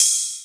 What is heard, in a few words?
Ride; FLPRO